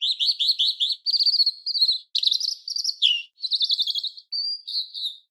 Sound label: bird mocking